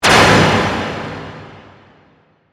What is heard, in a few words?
Hit Slam Reverb; Hit Sound Effects; Sound Design